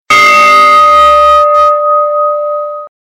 Bell Tower Ringtone

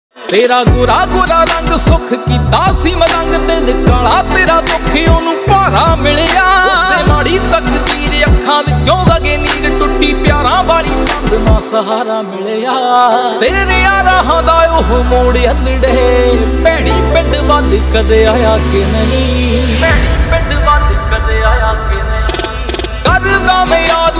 Desi Punjabi Ringtone

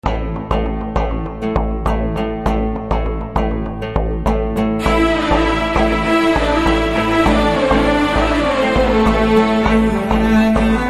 BGM Telugu Ringtone

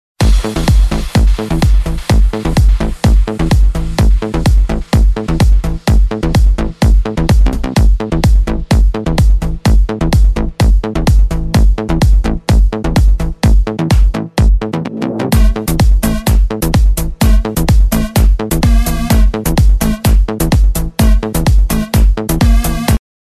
Techno Steps Ringtone

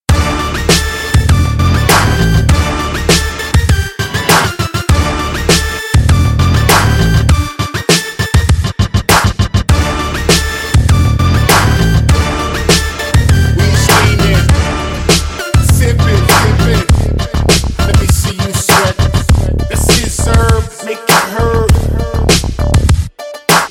Title Music Telugu Ringtone; Panjaa

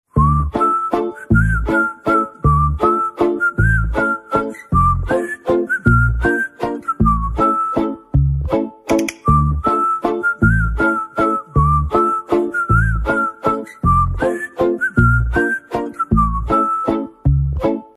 Whistle, Telugu Ringtone

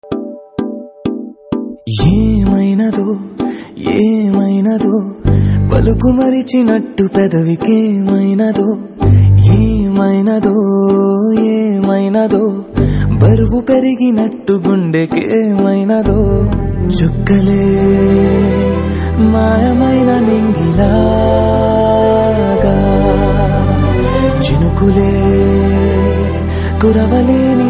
Telugu Ringtone

